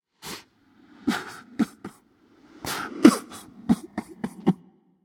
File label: boo hoo; cerberus